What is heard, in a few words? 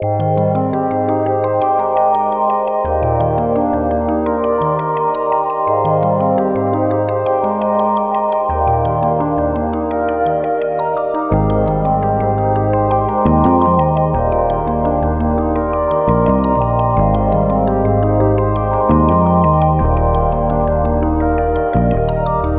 songstarter 85 Eb, Pop; SD PASADENA Pop Sample Pack; Slate